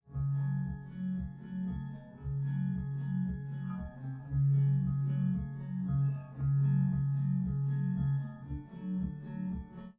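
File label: with drums, live rec; SiSEC11; underdetermined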